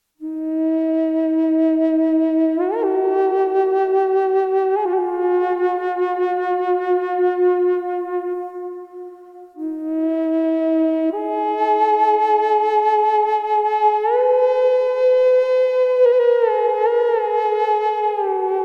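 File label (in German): Aeolian E mit Reverb, Native American Drone Flutes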